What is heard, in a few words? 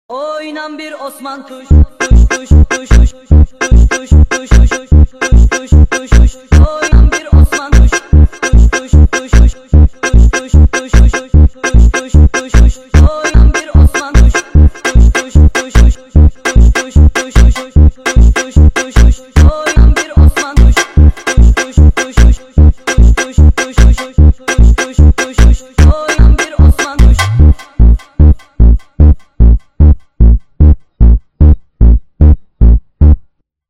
Funk sped Up